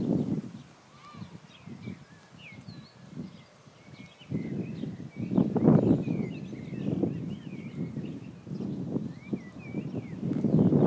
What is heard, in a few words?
english countryboo